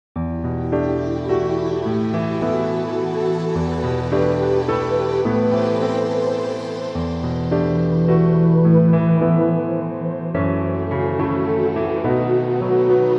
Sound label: Decaying place